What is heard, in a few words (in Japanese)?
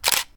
shutter